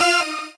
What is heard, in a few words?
normal hit normal